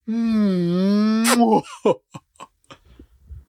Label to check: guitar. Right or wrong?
Wrong